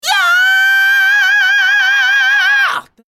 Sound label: yeaaaaah